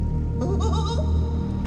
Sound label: Giggle; Pennywise